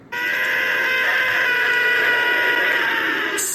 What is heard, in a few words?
Spoon Scream